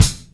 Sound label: drum hit normal